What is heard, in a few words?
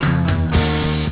saw light; beeps